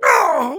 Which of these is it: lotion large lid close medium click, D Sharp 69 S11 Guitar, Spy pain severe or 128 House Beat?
Spy pain severe